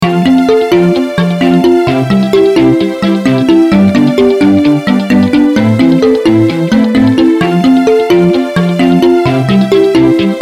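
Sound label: instrumental version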